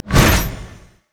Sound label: headshot; LoL; caitlyn